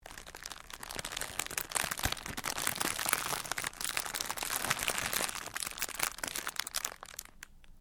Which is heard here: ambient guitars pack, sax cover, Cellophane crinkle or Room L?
Cellophane crinkle